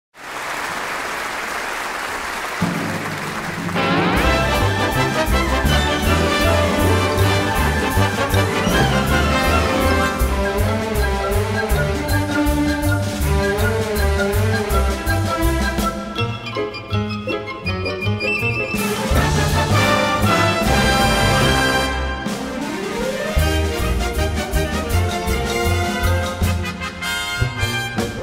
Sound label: bugs bunny cartoon, موسیقی انیمیشن; باگز بانی